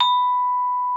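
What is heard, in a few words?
vibraphone H5; Xsample VOL-4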